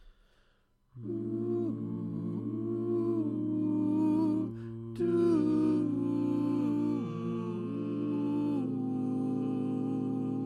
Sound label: All Parts, barbershop